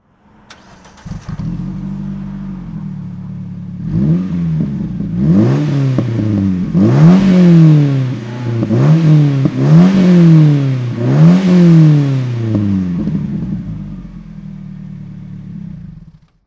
morgan 4 4 throttle; lemans62

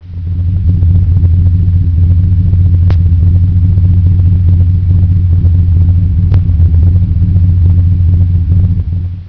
Facel idle

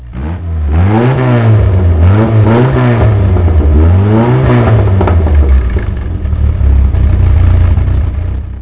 sprite mk3

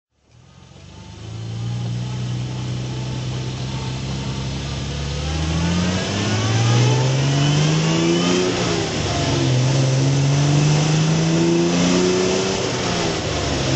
healey3000 mkII drive

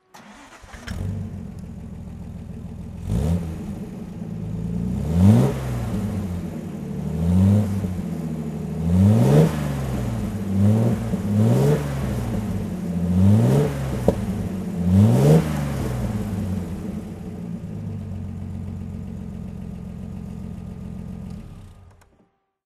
healey 3000 mk2 3c throttle

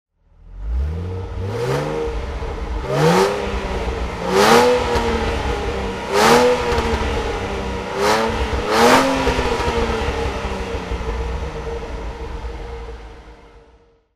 aston martin db7 volante throttle; Aston Martin DB7 Volante, 1997; Engine sound; V3D/ ClassicarImages; Marc Vorgers

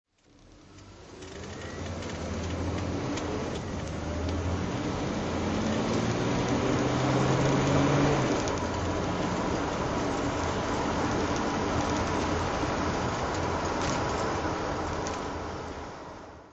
Bentley continental r drive

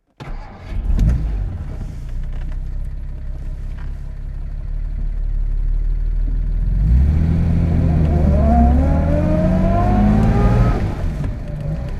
jaguar xk120 fhc driving

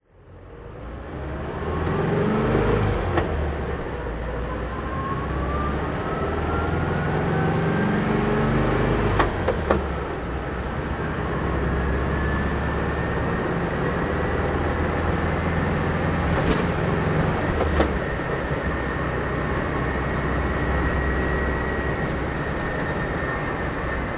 vw 181 kubel driving, engine sound driving; Volkswagen 181 'Kübelwagen', 1975; V3D/ ClassicarImages; Marc Vorgers

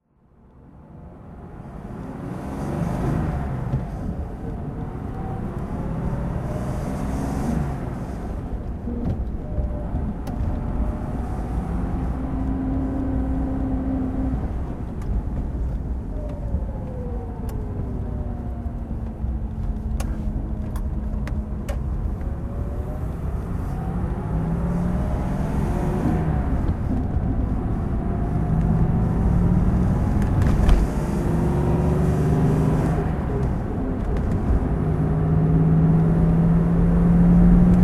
mercedes benz 300 SE drive

